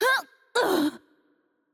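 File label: Korean HurtHeavy; bloom minigame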